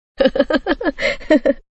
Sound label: wavenet laugh